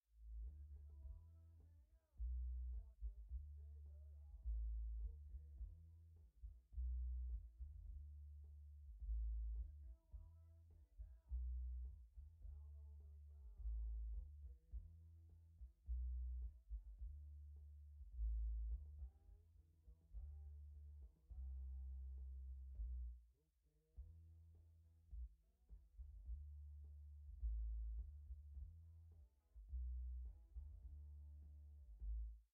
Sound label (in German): Musik, Schallschutz